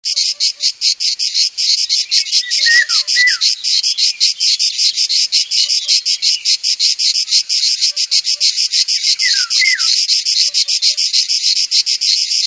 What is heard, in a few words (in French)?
cigales